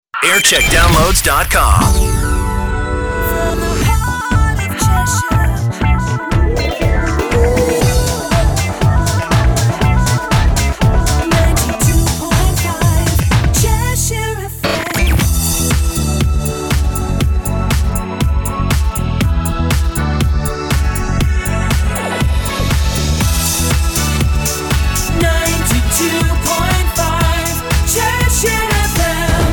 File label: jingle montage; cheshire fm